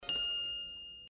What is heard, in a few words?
beep